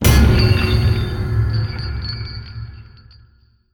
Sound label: crystal poke; naxx